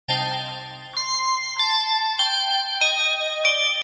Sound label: nice sms